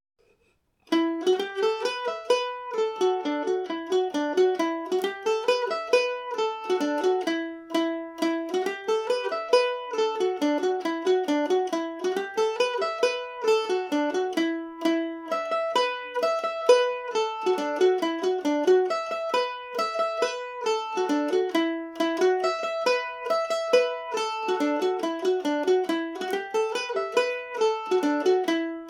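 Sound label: E dorian, Irish mandolin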